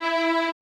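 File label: Vibraphone E3 R, AKAI Advance Orchestra; AKAI Advance Orchestra Upgrade 97 Vol.1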